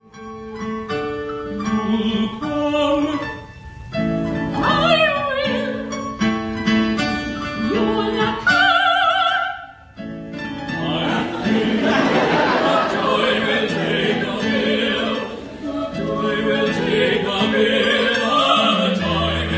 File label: laugh